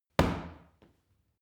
paw kick medium

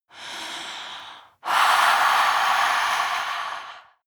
breath female eerie hiss

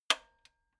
metal button radio spring low

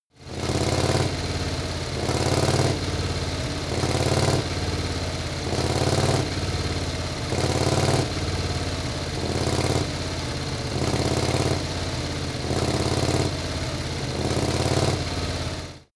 motorcycle harley engine rev roar sequences

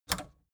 record player power click on fast

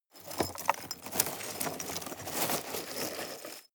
scrape rocks medium

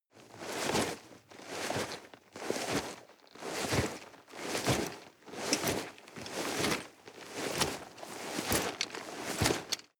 nylon leather walk sequence

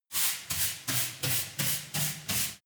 broom sweep fast sequence

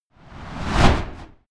torch flame whoosh

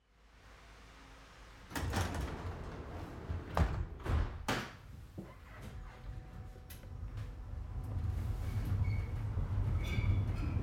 cable car cabin inside

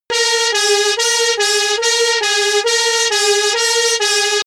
fire truck siren uk

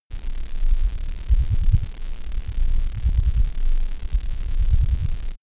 space alien machinery steady calm pumping

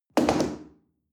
chair wood place hard

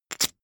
poker chip stack big rattle short

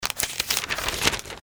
paper noise

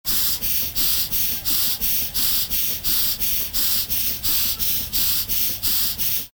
steam engine valve

